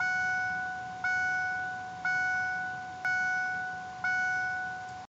f150 door ajar

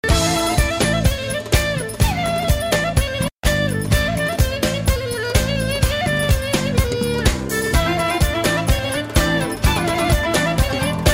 turkish jazz; ringtones

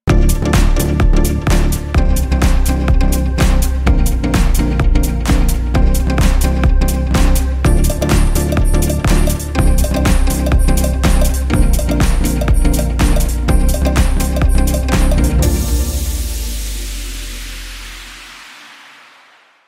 Melodic House

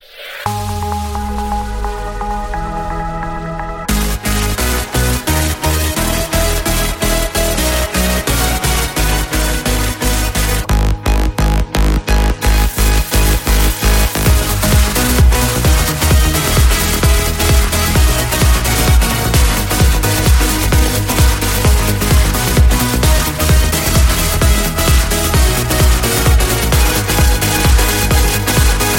trance, ringtones electronic

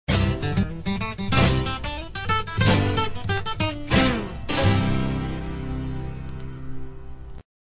guitar exit

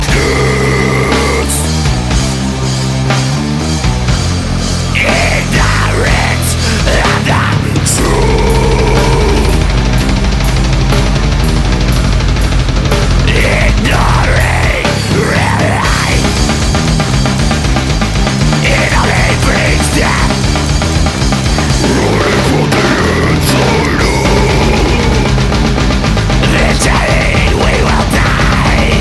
rrraaawrrrr, rock

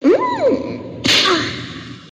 Whip; Succubus

